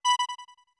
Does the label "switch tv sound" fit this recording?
No